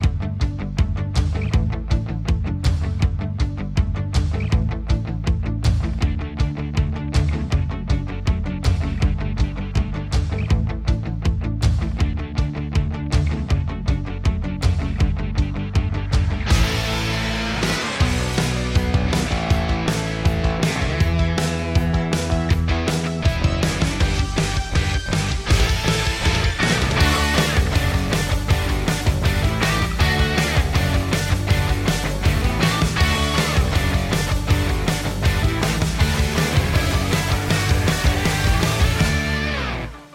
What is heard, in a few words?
Song Lets Go no vocals